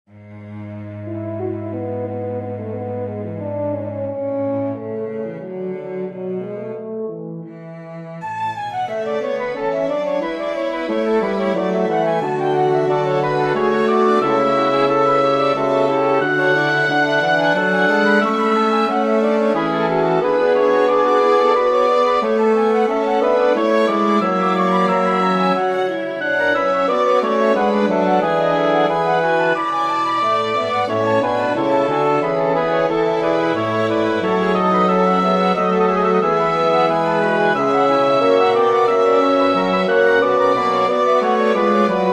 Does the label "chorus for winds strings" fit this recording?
Yes